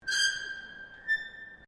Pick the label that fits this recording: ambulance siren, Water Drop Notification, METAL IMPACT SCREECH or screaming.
METAL IMPACT SCREECH